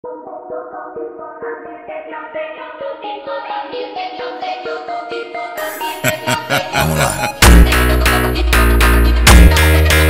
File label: montagem rave brazilian funk phonk